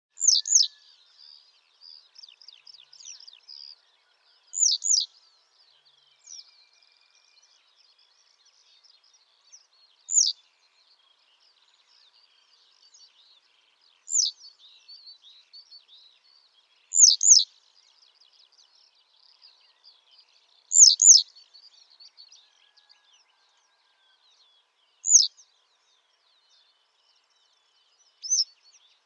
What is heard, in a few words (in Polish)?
pliszka zolta; bocian